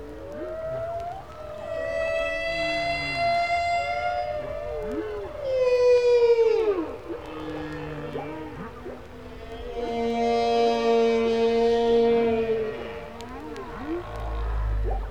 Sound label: whales; Rumbles